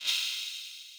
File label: Message; LFS